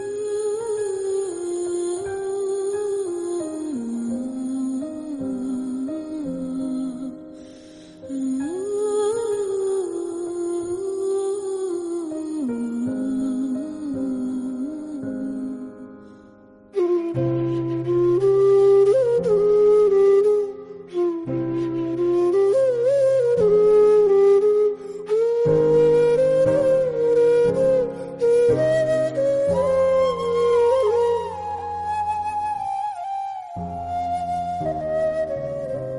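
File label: Sad background music; Policeodu; Telugu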